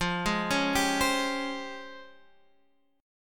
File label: C sharp Major Sharp 11th F